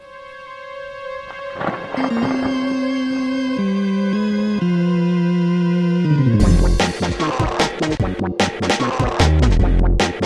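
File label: instrumental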